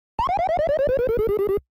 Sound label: Duck hunt laugh